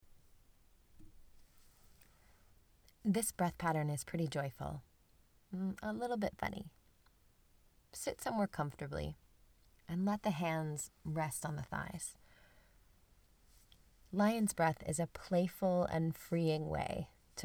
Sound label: lion's breath